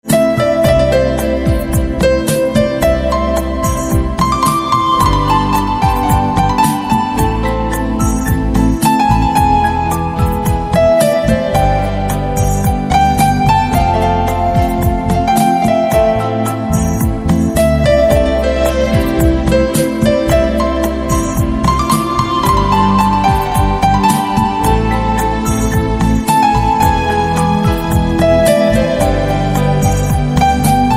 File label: Piano